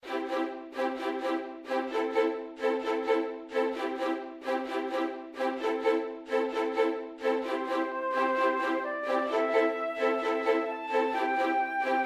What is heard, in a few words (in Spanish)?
menu principal